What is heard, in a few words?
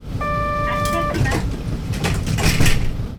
door